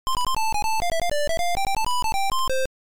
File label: Title Beeper; The Fall Guy